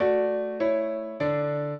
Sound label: minuet, piano; Mozart